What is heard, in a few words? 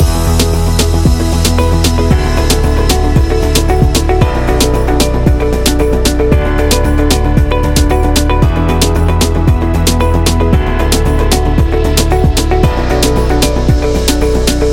Interview Loop Report; corporation